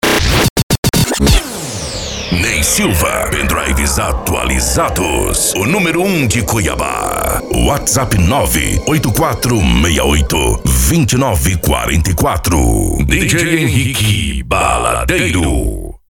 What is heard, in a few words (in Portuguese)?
sertanejo